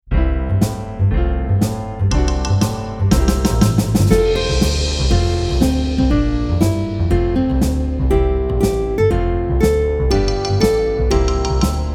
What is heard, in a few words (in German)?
Mutti, darf ich instrumental